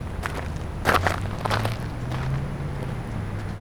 Emancipation Park Footsteps, percussion; Third Ward; Houston

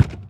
Ticket Counter Perc

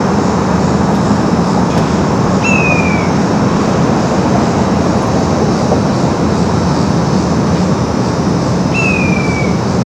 Bird, birds; First Ward Allens Landing; HOUSTON

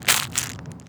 Flamin Hot Cheetos Bag, percussion; HOUSTON; Moody Park

